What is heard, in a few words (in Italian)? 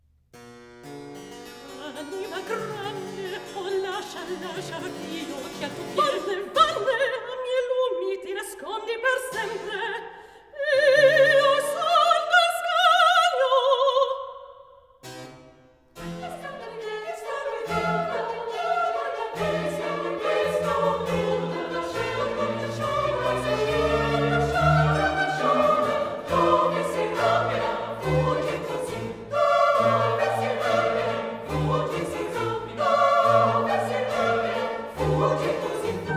Recitativo & coro, coro di pastorel; KV 111 Ascanio in Alba 1771; Ascanio, Silvia; Mozart; Operas